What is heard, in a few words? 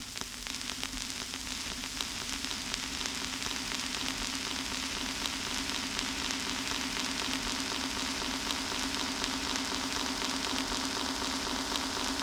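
Vinyl Ping Pong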